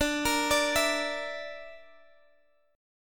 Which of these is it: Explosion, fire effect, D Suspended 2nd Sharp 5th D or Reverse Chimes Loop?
D Suspended 2nd Sharp 5th D